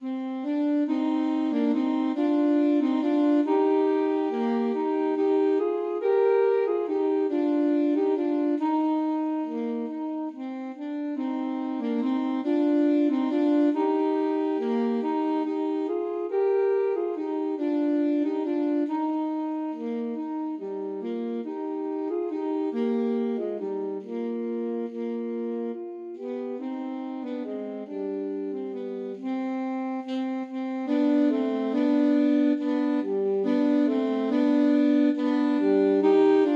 siciliana Alto Saxophone duet

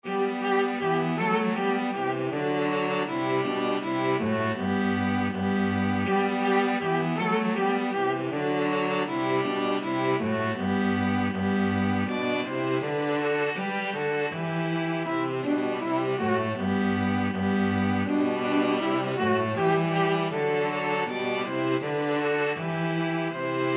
string trio

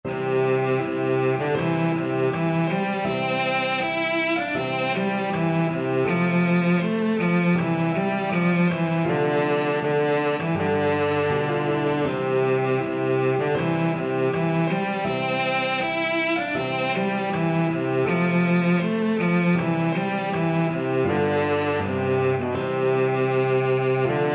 scotland the brave, cello

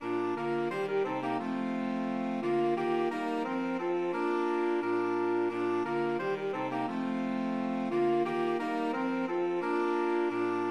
ding dong SAX quartet

